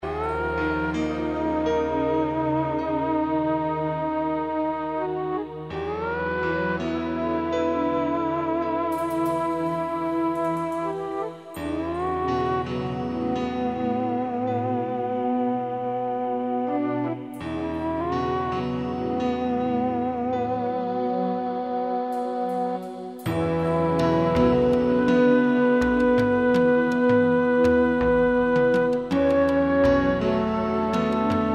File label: Clouds Woodland Dawn